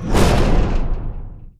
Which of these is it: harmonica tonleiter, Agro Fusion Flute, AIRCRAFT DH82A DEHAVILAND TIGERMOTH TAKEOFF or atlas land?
atlas land